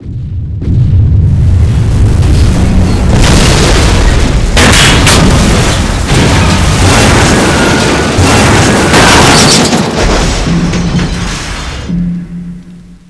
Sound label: core detonate